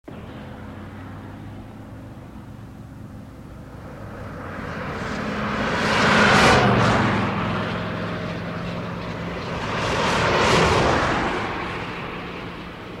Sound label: Freeway Traffic